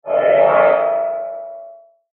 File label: METALLIC WHOOS, Metallic Whoosh Scrape Ringing Reverb; Metallic Sound; Blastwave